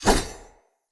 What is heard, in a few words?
Saw Dagger Whoosh, Weapons Swords; Player